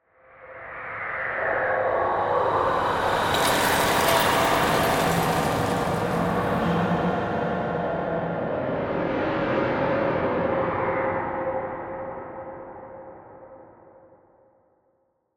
Ghostly Movement, Horror, Ghostly, Movement, Haunting; Ghosts; BlastWave